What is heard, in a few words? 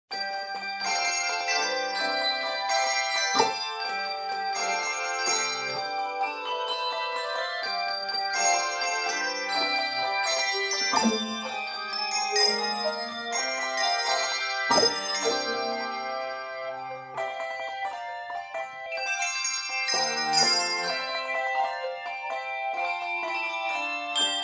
fanfare